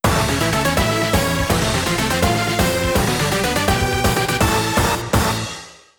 Goal; paper mario the thousand year door complete; Super Bowser Bros.

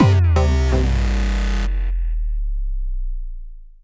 Game Over Sting; viral ex windows 2016

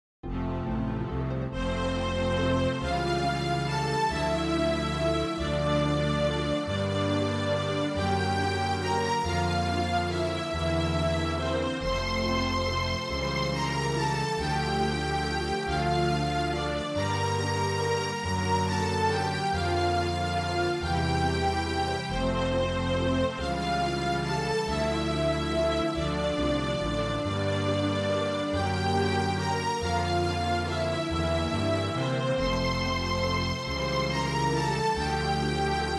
MIDI Scene

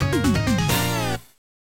Start Music; Ordyne; Namco System 2; Arcade

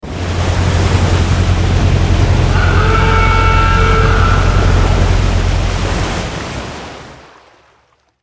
Enemy Motorboat Arrive; Godzilla Smash3

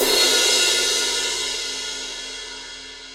Crash

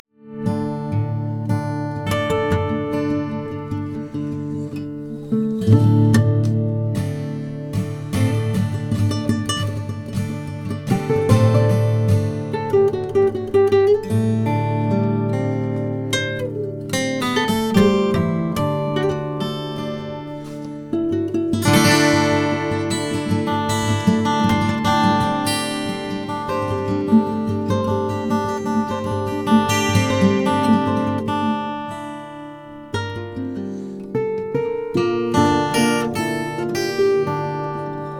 Guitar B; Camp D075 Guitar B

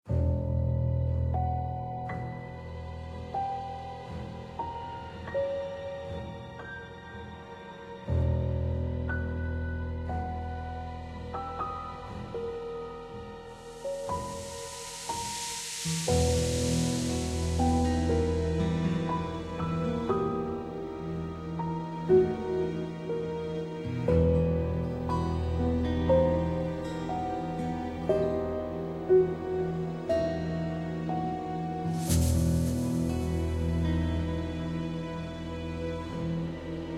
Timeline BGM; AFK Journey; Android

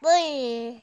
Headshot; MilkChoco

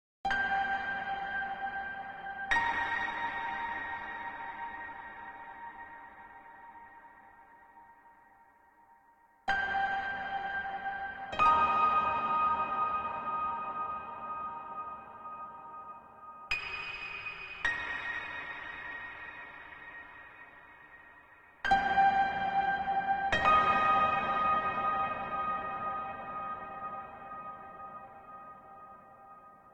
Title Piano; mr future ninja

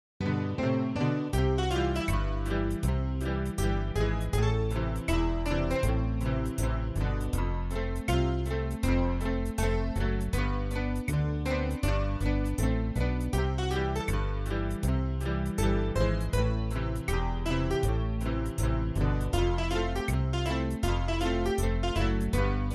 MIDI Scene

